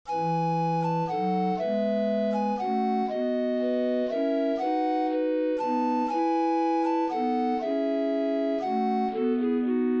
minuetto, trascrizione per grande organo; Terpsichore

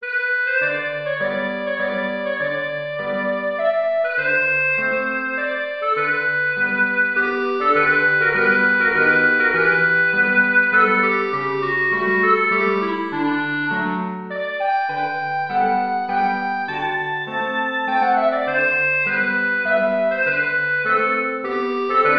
minuetto; beethoven ludwig van

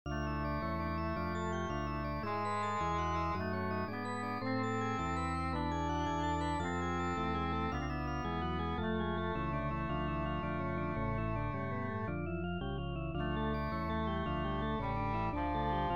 trascrizione da concerto per grande organo; krebs johann ludwig; christ lag in todesbandes

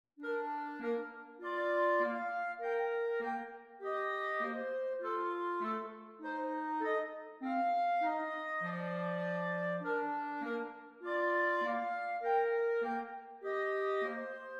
duetti progressivi

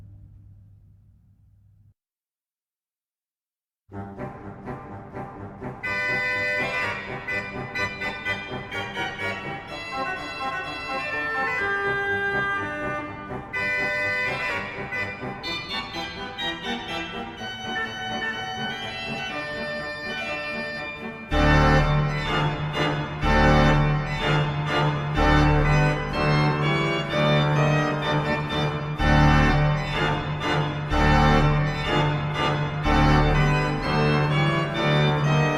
allegro per organo; ponchielli amilcare

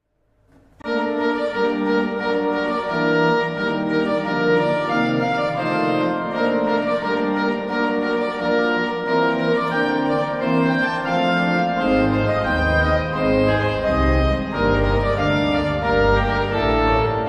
piccola fanfara; bossi marco enrico

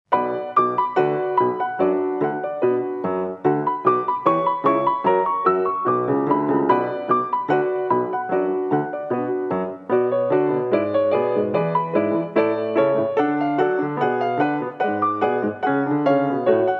trascrizione per pianoforte; badinerie

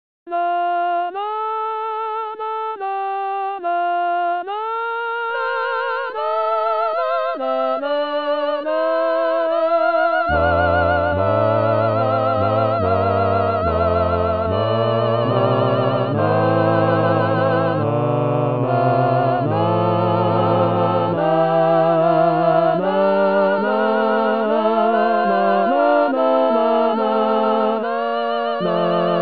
fuga a 4 voci; bomben stefano; sei tu signore il pane

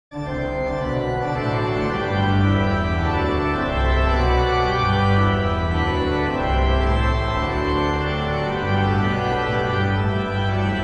trascrizione da concerto per grande organo; Rouget de Lisle, Claude Joseph

